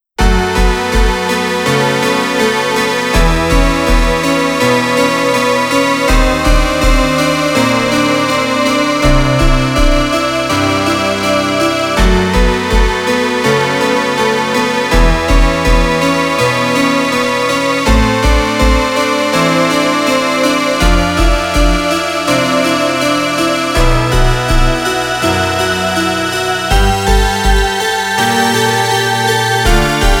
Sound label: Synth